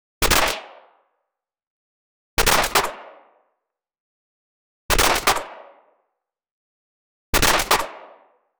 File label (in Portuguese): abilidade de uma pistol a, burst fire